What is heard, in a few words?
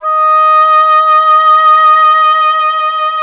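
oboe, wind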